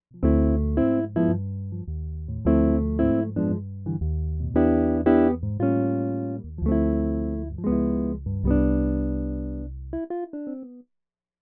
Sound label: DIGITAL CHAMBER